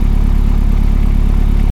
Rolls Royce Cullinan Idle

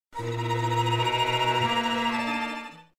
Stealth Sting

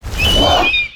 Boss Attack

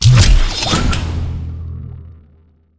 Reward; Drifting Lands

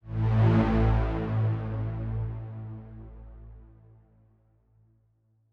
Chime; chime sharp

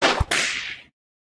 Whiplash; Grimrush

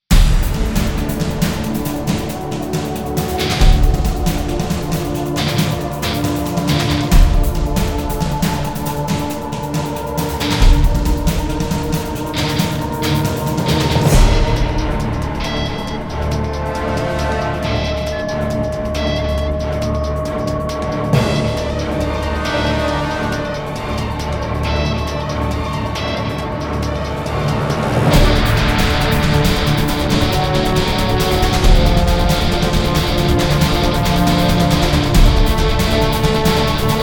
Battle Loop; darkstar one; windows xbox 360

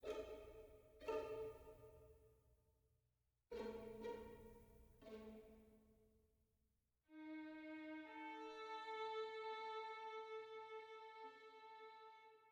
ambiant dark

